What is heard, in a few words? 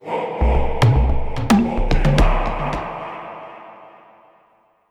basicMood Cueva Bosque